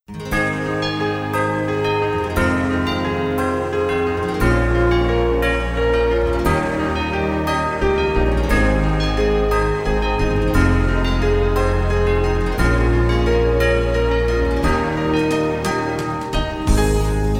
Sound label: BELL CHIMES fade; symphonic instrumental entertainment- and film music